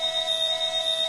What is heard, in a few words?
fire alarm tank, heli; wac